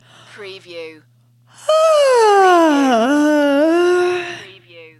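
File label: Female Yawn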